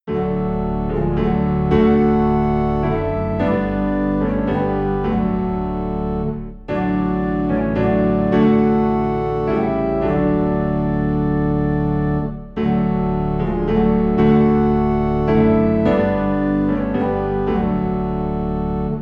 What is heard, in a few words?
piano and organ low in D; Jesus I Come